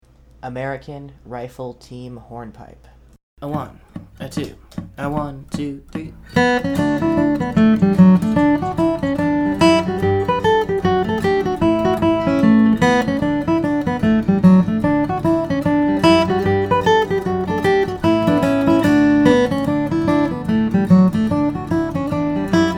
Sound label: Hornpipe